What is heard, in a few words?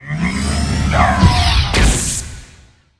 minotaurus attack, monster